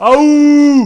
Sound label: auuuuu